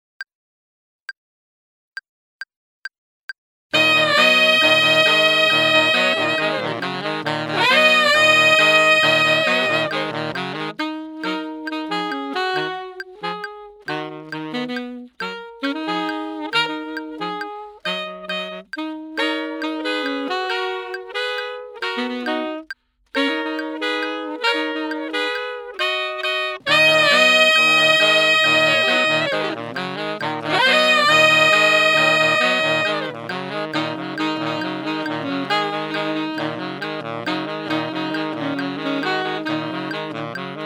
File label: playalong bari